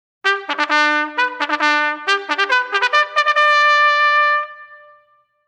Liberty Call, drum corps

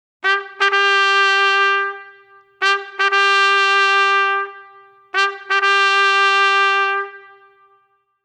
First Call for Mess, drum corps

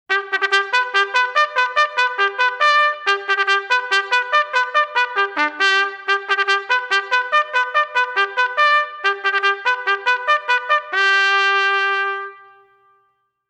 Guard Mounting, drum corps

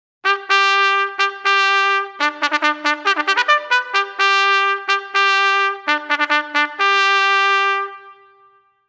Pay Call, drum corps

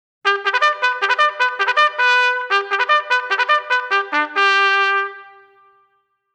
Officer's Call, drum corps

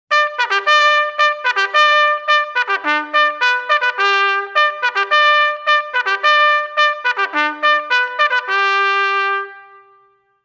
Adjutant's Call, bugle call

